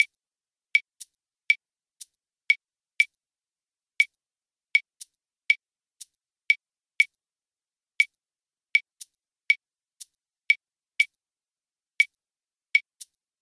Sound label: yambu habana clave